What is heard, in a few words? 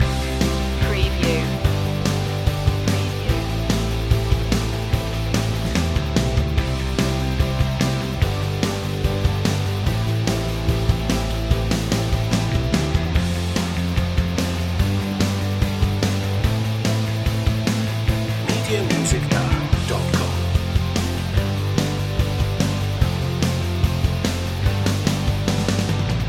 rockin loop